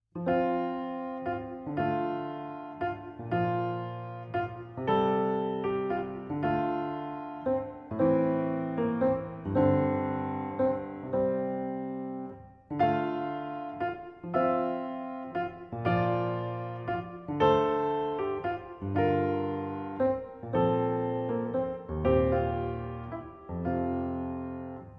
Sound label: Nocturne F Major